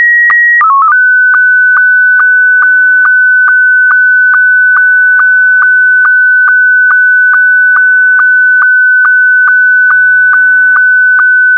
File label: sstv scottie1